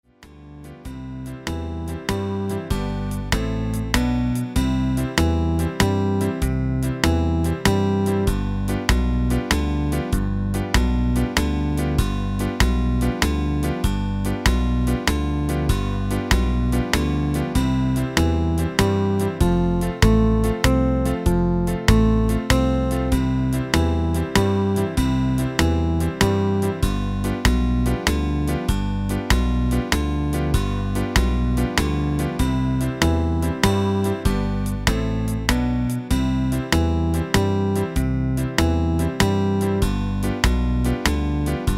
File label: walz